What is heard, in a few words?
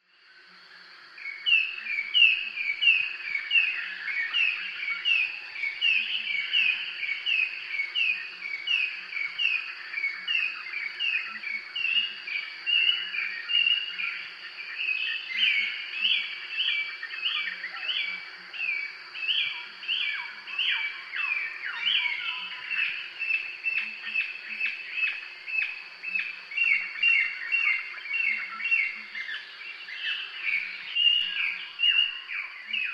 Thrush Sound